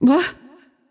confused; smite; voicepack; medusa

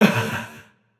heh heh, voicepack; smite; jorm

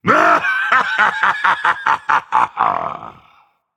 laugh, dragon; fafnir

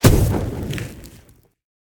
hit fire; smite; god merlin

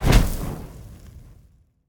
cast fire; smite; god merlin

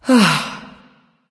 nv sigh; smite; awilix

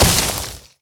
hit ice; smite; god merlin

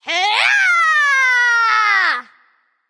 battle cry; smite; persephone

